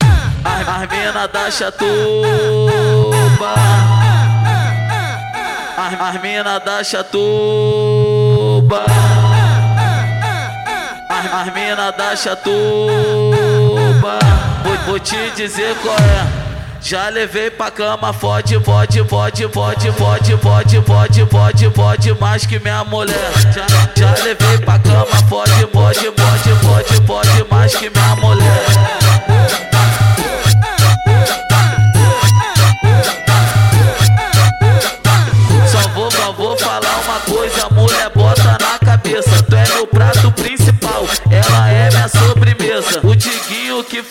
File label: funk bass